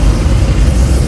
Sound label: Rocket